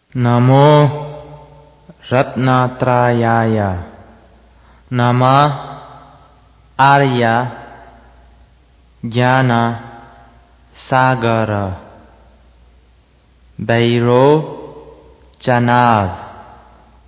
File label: Great Compassion Mantra